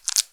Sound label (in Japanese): くちゅ音単発